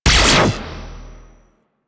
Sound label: Laser Rifle Fire; Crp Laser Rifle